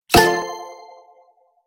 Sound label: Cartoon confirm button